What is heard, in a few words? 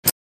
metalic Move; damas-tv